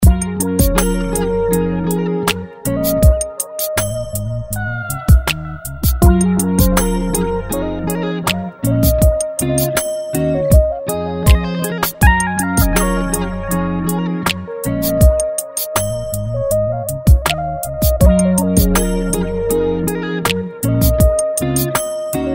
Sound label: Ringtone, Samsung GT-C3110